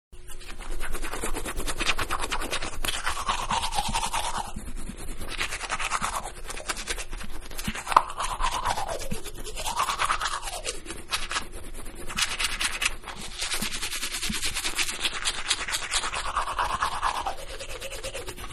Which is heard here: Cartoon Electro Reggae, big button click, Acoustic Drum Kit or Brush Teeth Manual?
Brush Teeth Manual